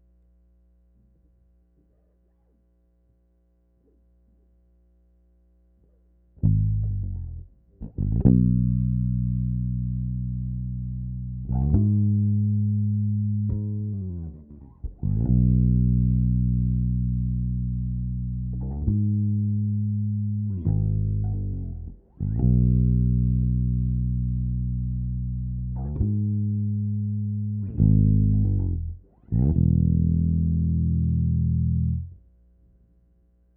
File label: bass takes